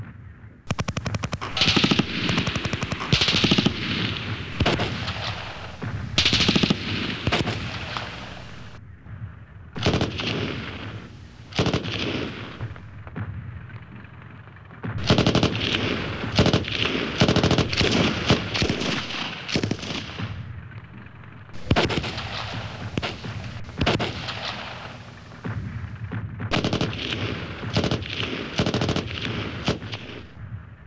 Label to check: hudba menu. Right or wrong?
Wrong